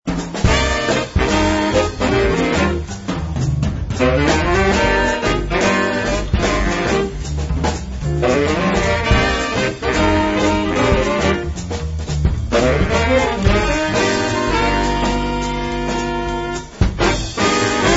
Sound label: sax